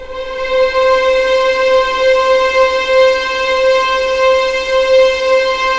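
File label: C5 legato violin, right, The Ultimate String Collection; East West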